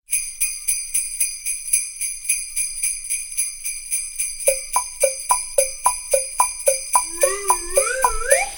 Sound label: Xmassound